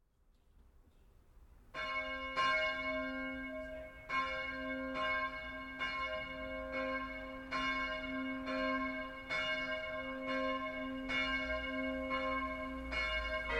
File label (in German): Kirchenglocken Bayreuth-Altstadt